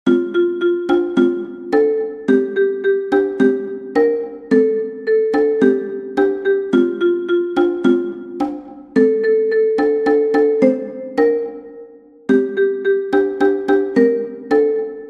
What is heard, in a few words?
Cajon; Atte katte nuwa